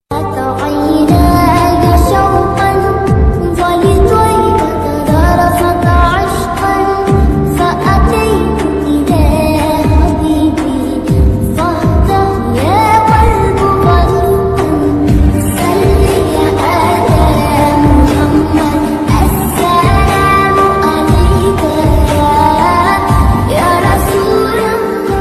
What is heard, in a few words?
Islamic mobile naat ringtone urdu